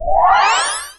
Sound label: create a short audio cue, transition